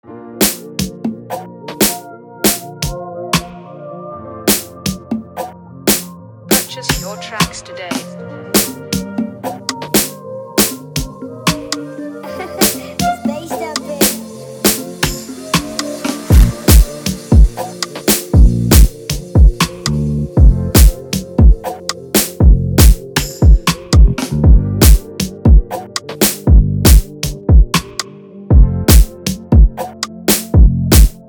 118bpm

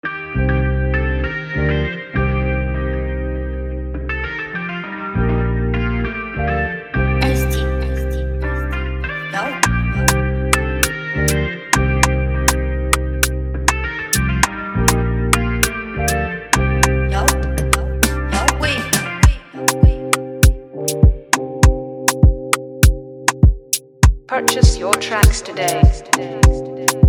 FREEBEAT

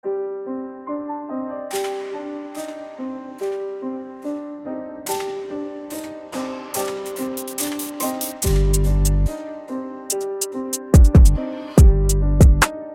drill beat

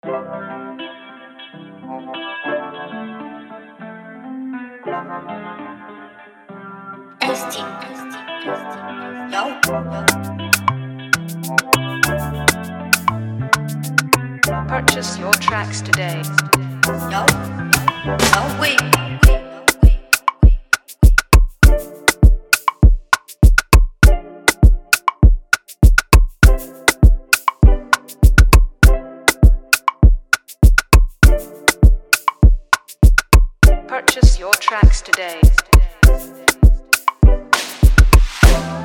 FREEBEAT

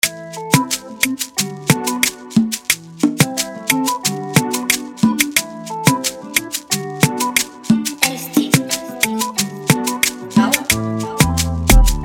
FREEBEAT, type beat

